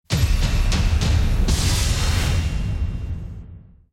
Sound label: arena countdown